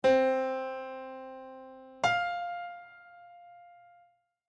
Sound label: music intervals perfect eleventh